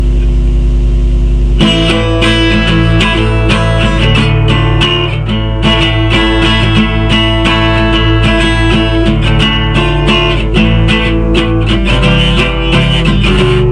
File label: Salsa coustic